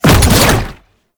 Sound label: Wall Climb Cast; Warframe; Oraxia